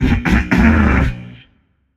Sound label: clear throat, voicepack; smite; jorm